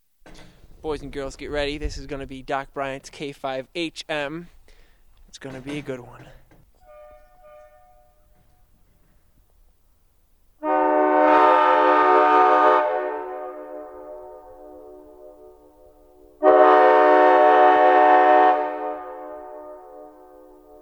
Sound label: horns